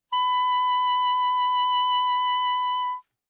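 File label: Soprano Saxophone vibrato ff B4; Woodwinds; Bb Soprano Saxophone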